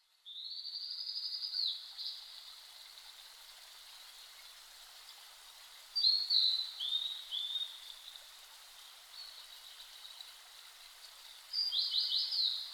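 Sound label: blue-and-white flycatcher; ooruri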